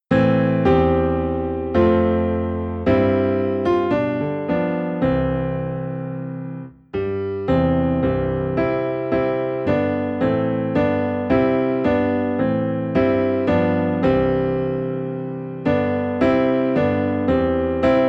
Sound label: Piano Low in C; All Hail the Power